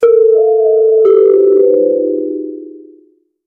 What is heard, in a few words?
comedic womp womp tone